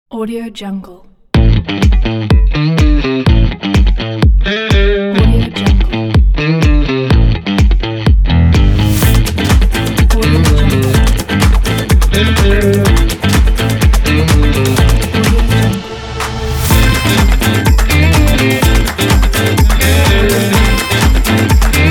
upbeat percussion funky logo

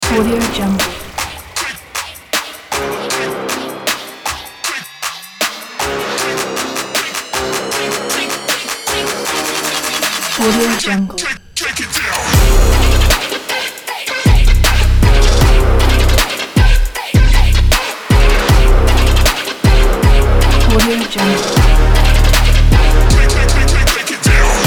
aggressive sport gaming trap beat

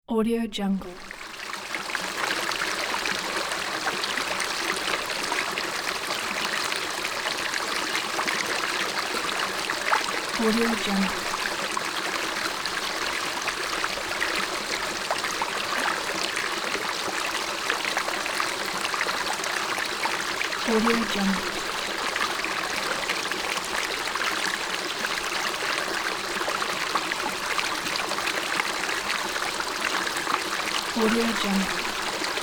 water flow

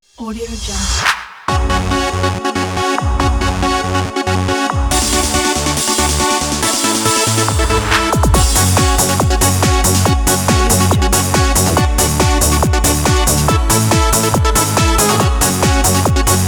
eurodance 90s ident

